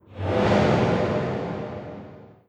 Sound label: shake wet, strider, combat, damaged; creatures